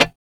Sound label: TSCHAK